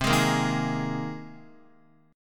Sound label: C Minor 7th Sharp 5th C Guitar; Cm7#5 strummed; JGuitar